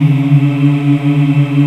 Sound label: VOX AFMM, VOX Afro Mms, Africa